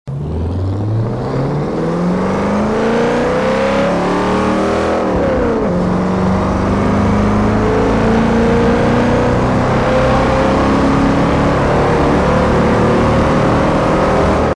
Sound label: first, vehicles; impala96; TDMCarpack; TDMCars